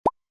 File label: click